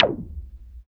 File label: CYBER, Drums; Drums In Your FACE!; CYBER PRC